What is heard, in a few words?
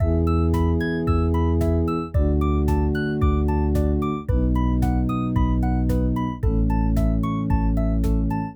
corporate clean 112bpm loop